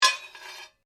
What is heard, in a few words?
Blade Lock Scrape, Sword